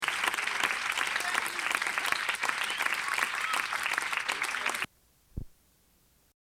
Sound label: audience appreciation